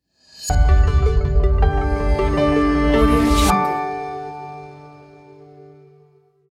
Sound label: clean logo